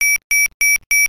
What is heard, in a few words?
Nextel Ringer